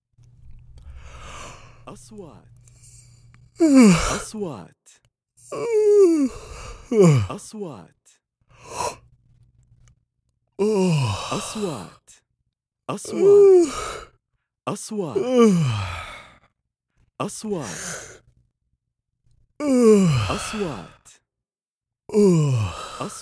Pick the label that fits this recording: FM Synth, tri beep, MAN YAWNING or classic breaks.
MAN YAWNING